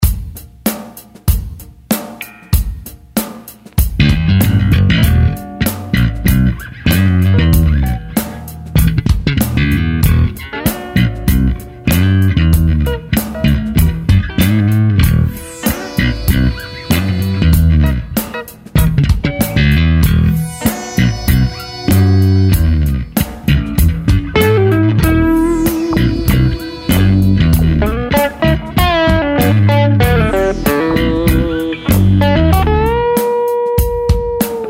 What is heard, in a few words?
sansamp bass di